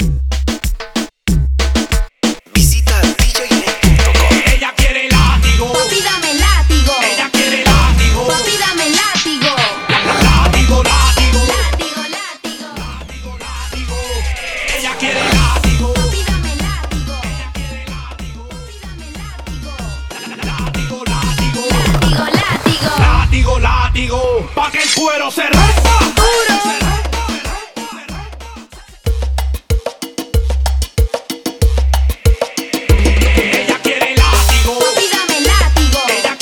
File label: Acapella Intro